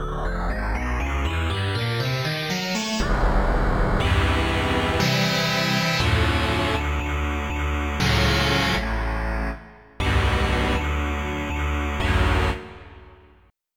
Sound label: Guitar Feedback